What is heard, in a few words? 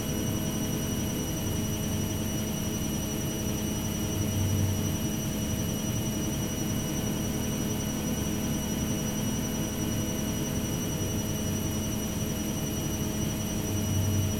Smelter; Mekanism tilera Edition